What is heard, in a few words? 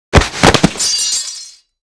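female knight die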